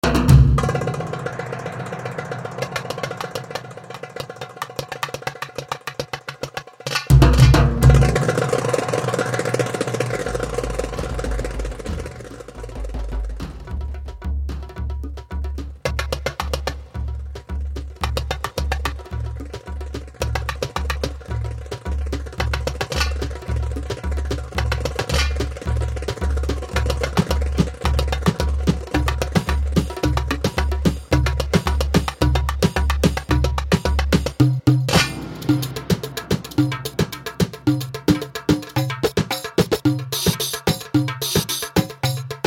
solo tabla

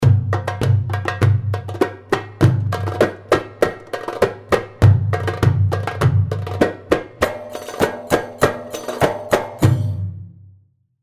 Sufi 100, darbuka

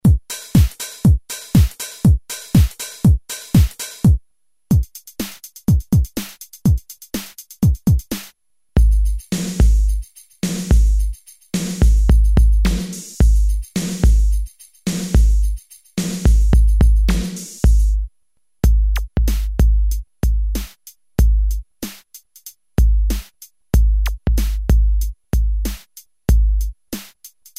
dance hip hop beats

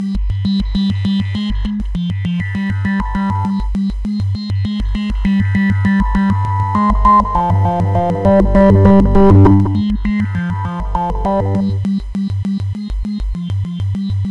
No Trance Bass

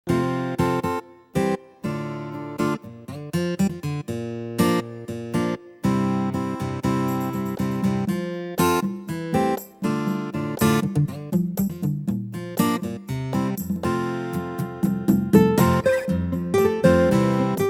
Tyros Style Unplugged

